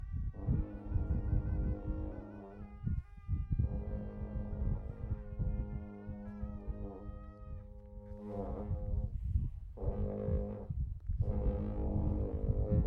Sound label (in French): trompettes tibétaines; Chine